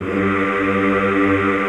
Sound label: Classical Choir, G1; Best Service; Extended Classical Choir